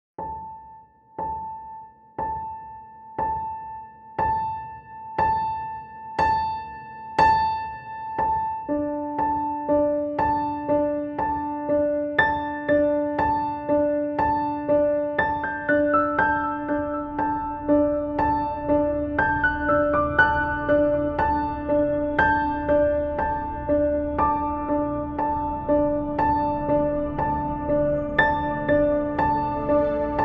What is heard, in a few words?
apple piano